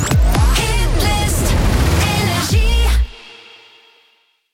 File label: Jingle; NRJ